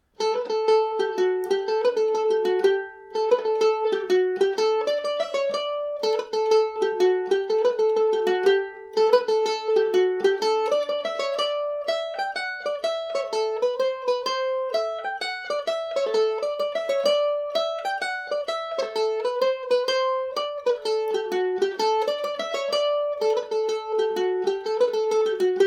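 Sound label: another jig will do D major, mandolin; G&O #34 mandolin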